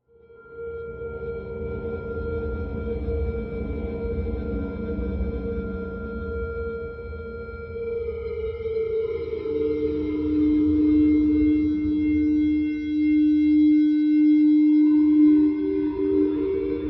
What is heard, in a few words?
Atmos Dreamy Delays, sound design; B Movie; Beds